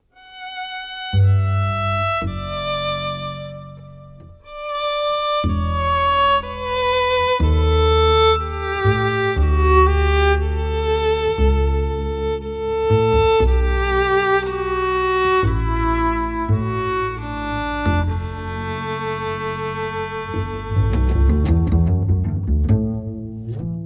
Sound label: Ensemble